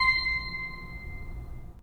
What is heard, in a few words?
3D Pipe Mix Celeste, 3D Organs